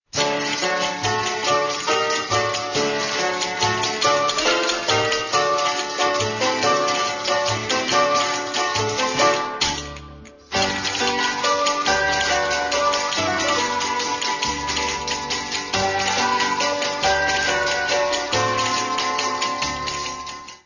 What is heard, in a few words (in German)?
spanischer Tanz; filmova hudba; wilder westen heisser orient